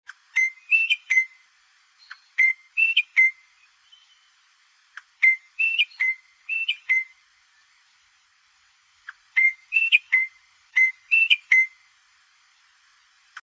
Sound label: platycercus elegans